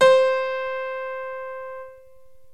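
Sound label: pianino single c6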